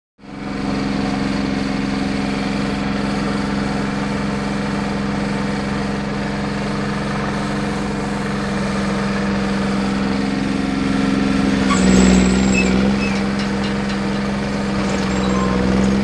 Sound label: Crane; Crane Working Back And Forth And Switch Off.